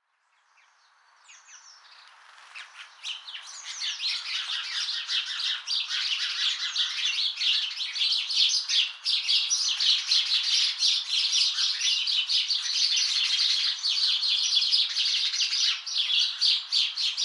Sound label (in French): Moineau moineau crie